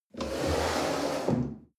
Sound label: chair wood slide on floor medium bump